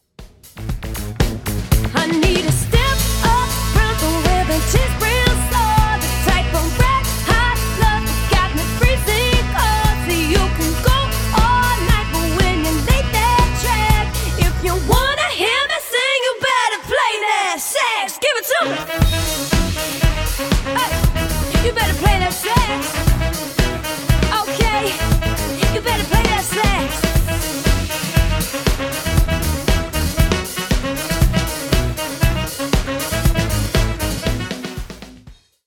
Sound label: sax